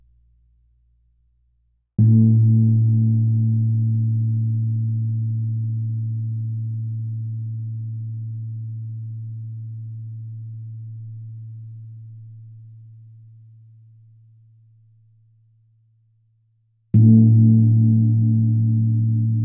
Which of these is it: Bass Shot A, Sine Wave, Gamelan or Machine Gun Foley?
Gamelan